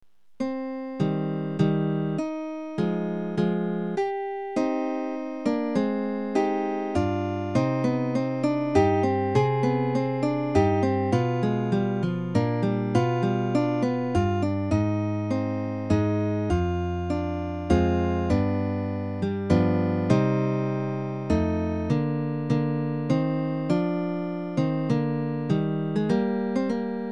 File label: C Minor, Guitar; Short Piece In C Minor For Guitar